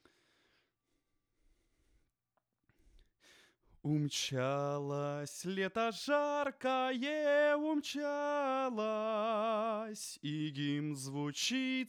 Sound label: vocal